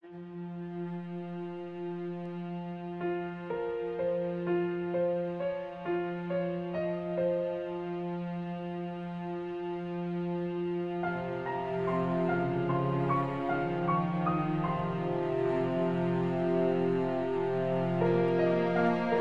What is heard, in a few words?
Arc Of Suspense